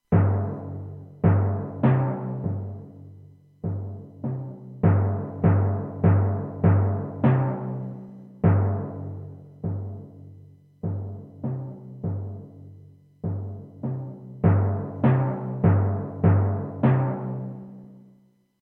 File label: timpani basic; timpani etueden